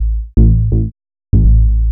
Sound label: HOUSE BASS 125 A